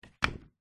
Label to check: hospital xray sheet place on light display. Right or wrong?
Right